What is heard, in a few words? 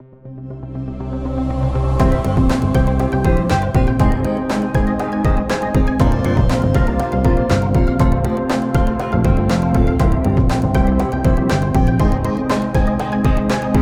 Music Quest Urgent